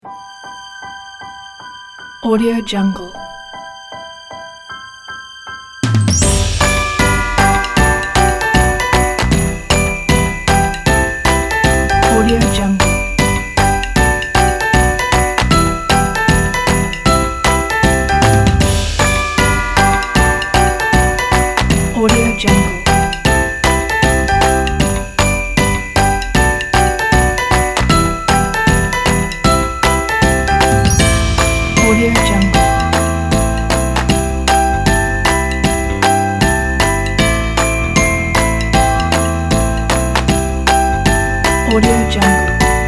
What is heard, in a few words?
christmas fun